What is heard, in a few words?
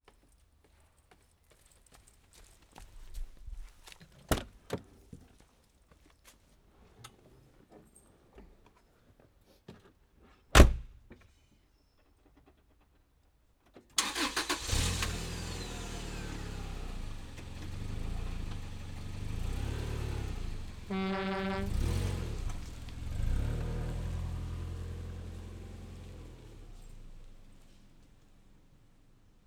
car horn depart; sound effects and sound reinforcement